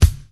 airy kick one shot c sharp key

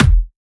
bass drum sound e key; kick drum samples

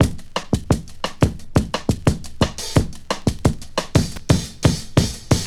88 bpm hq breakbeat g sharp key; drum loops

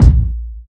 short reverb steel kick drum sound a sharp key

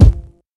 phat bass drum one shot a key; kick drum samples

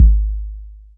round kickdrum sample g sharp key

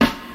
puffy snare sample D# key; snare drum samples; one shots

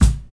original steel kick drum a key; kick drum samples

